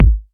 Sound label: puffy bass drum sample a key; kick drum samples